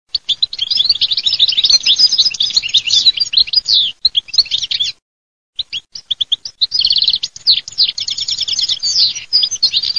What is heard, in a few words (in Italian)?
CARDIN CADELLATO